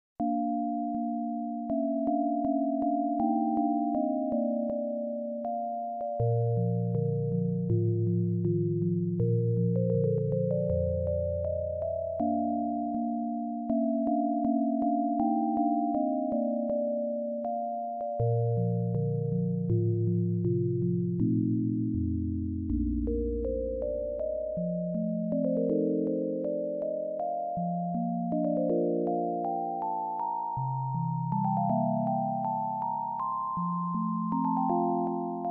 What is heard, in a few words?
piano bell